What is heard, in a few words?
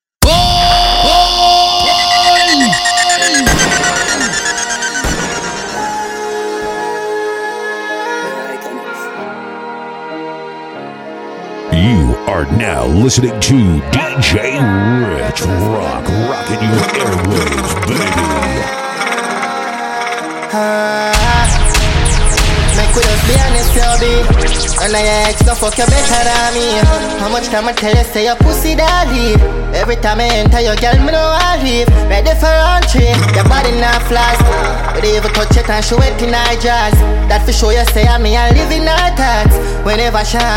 dancehall reggae